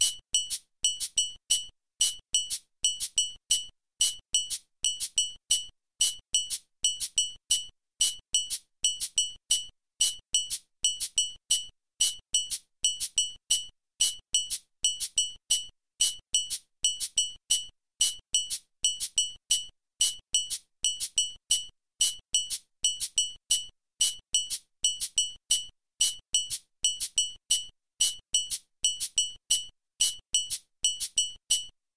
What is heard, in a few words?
abakua matanzas ekon; Les sociétés Ekpé